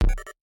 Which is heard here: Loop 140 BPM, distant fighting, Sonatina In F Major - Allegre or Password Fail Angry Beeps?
Password Fail Angry Beeps